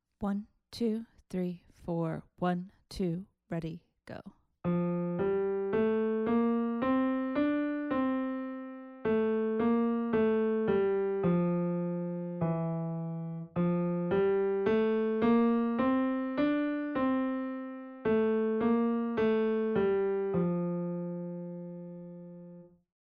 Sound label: melodic dictation